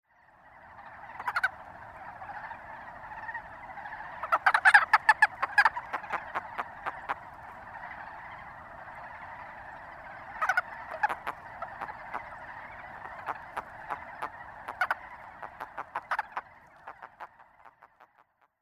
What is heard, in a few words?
yamaaka release call